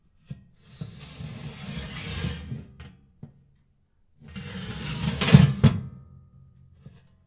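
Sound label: Metal door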